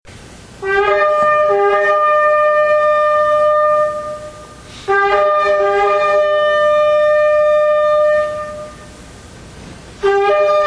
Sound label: Shofar